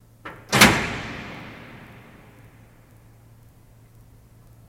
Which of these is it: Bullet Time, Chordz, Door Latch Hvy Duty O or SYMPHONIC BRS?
Door Latch Hvy Duty O